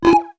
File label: teleport open; gonner linux macos ps4 switch windows xbox one gamerip 2016